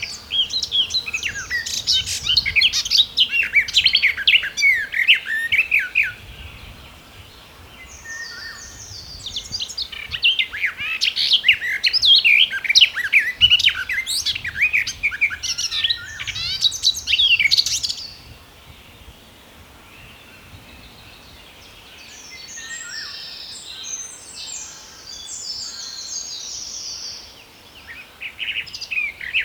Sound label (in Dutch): tuinfluiter wielewaal zang